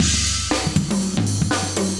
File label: chique 120, loops, 120bpm; Sound Effect Library